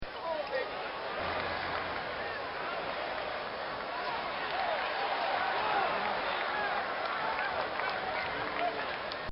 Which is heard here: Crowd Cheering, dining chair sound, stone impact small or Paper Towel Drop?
Crowd Cheering